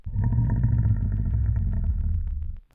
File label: Growl, beast or animal